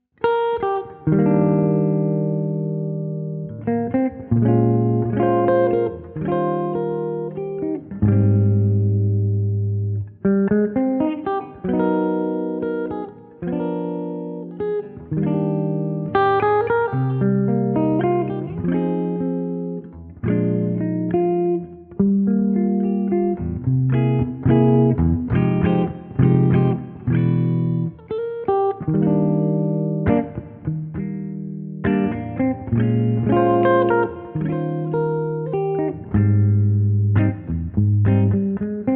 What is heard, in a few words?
Gibson ES175